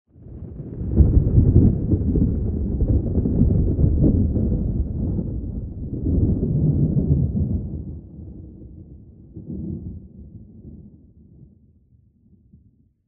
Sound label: thunder; source engine; tf; lair